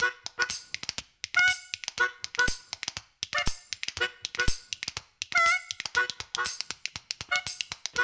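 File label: 120 bpm, Spoons; Spectrasonics - Supreme Beats - World Dance; Daoud Spoons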